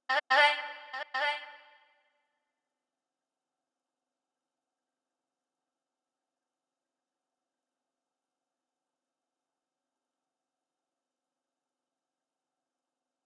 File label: 143 BPM D Min Vocals, loop stems; Melody; Cymatics; 2022 Melody Collection Platinum Expansion - Pt 2; Takeover